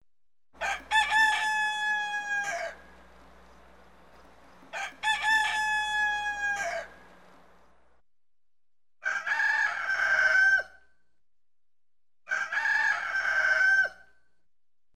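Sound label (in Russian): крик петуха 2 варианта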